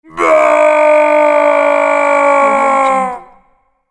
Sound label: male epic scream